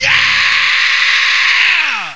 Level Clear; Apeiron 1.0.2

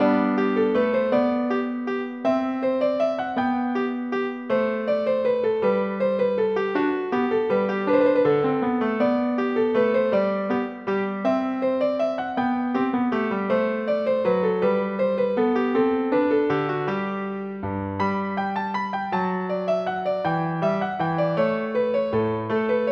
minuet

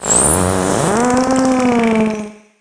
stingray die; DAoC; Toa; Gamestar

